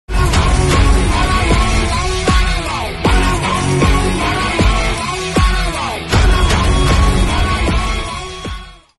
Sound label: ringtone bgm (background music); kabir singh